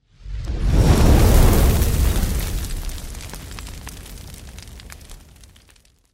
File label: fire